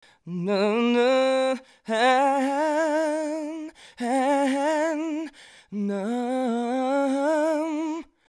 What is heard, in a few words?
trill up